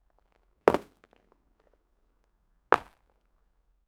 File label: a pair of sneakers being, footwear